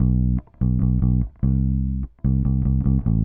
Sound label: Bass, Indie Rock